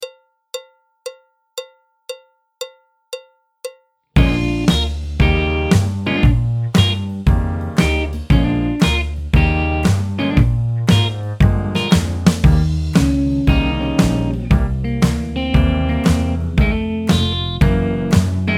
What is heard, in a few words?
Tour de Fours BT (alto, trumpet, trombone); Jazz Syllabus Level 3